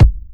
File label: Kicks Loud